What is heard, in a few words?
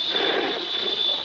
full breath